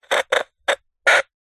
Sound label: cb radio walkie talkie crackle beep button; technology; zapsplat